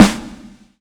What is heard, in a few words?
SN, S. DRUM, House Side Of 2 Step; USB Soundscan vol.51; AKAI